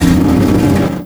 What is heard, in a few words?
pontoon scrape rough, airboat; hl2